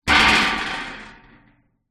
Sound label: metal baking tray down on surface; household; zapsplat